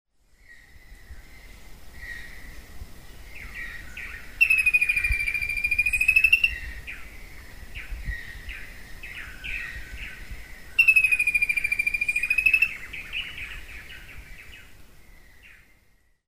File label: Formicarius colma Galinha do mato